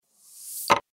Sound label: chip to player; poker game